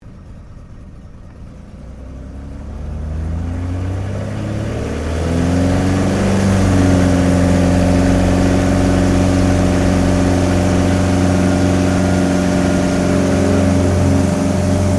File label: AIRCRAFT CT4A TAKE OFF INTERIOR, military trainer aircraft; Airplanes; Vehicles